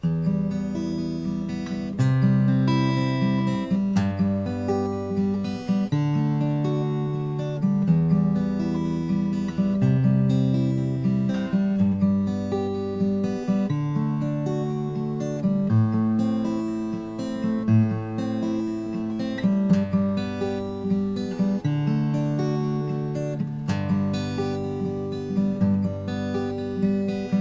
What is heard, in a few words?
Fingerstyle; Single Take